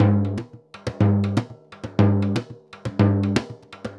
SURDO, DRUMS SAMBA; Best Service ProSamples vol.14; AKAI

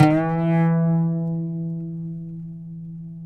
PLK OUD, Roland LA Composer

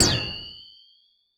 Korg 01W MegaDrums; 300 Drum Machines

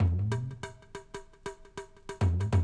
Classic DrumLoops

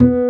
WOODB SS B3; Analogon

